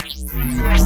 bass

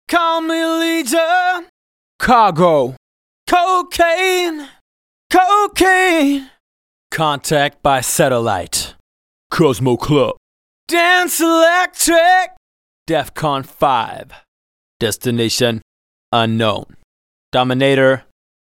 Fab Acapella; Techno Trance Essentials

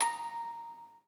musicbox

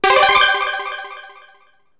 SE RESULT RATING UP; maimai

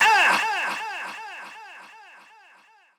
vox

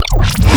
custom bass

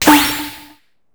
RESULT SCORE; maimai

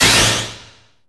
SE GAME BREAK; maimai

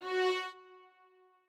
strings

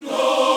choir

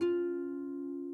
harp

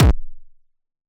Kick hard; otto入站; 电棍

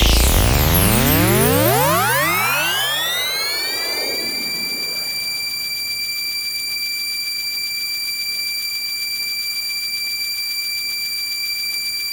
GAME TOUCH HOLD GREAT; maimai

